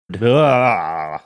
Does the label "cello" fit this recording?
No